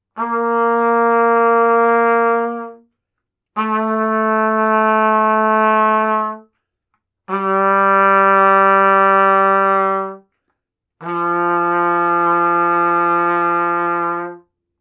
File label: c b a g low, trumpet